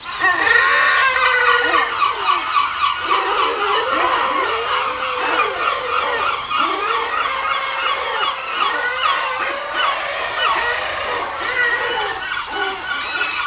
jungle; Motorola MPX200

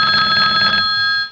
Ringin; Motorola MPX200

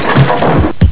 lumber crash; Motorola MPX200

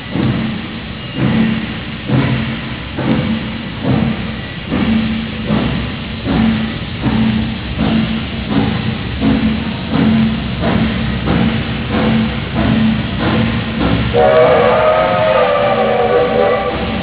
old train; Motorola MPX200

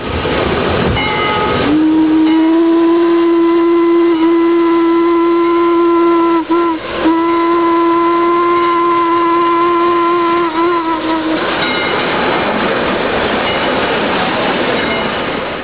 train move; Motorola MPX200

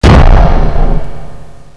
hit, Battleship

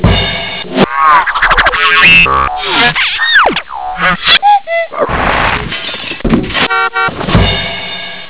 clown comedy; Motorola MPX200

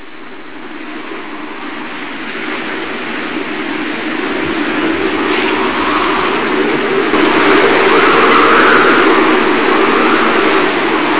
storm blows; Motorola MPX200

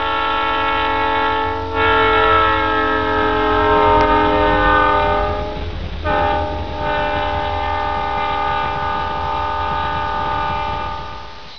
train pass slow; Motorola MPX200

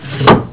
close window; Motorola MPX200

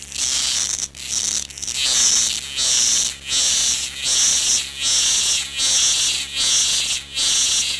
locust; Motorola MPX200

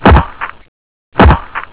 chop; Motorola MPX200

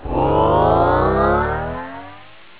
stretch up; Motorola MPX200

